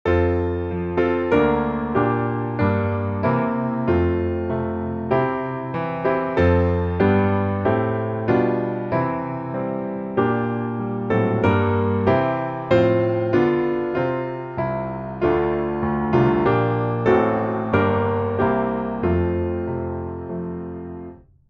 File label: piano